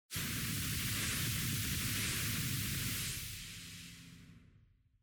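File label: Compressor air out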